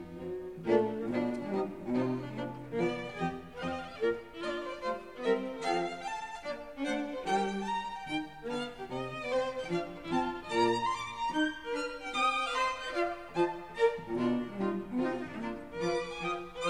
Große Fugue for 2 Violins, Viola, and Cello in B-flat major, Allegro molto e; con brio; String Quartets; Beethoven